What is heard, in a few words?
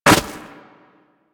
Operator Run Snow, Footsteps; Warframe